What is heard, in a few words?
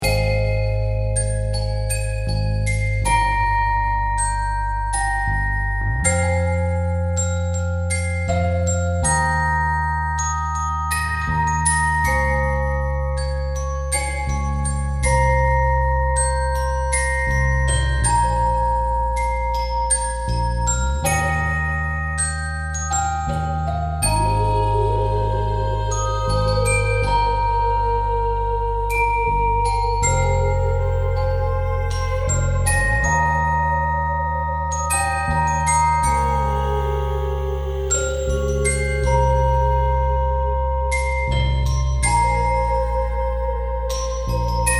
Healing Melody